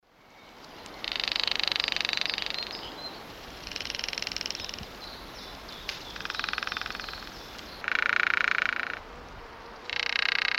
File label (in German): wolfsspinne trommeln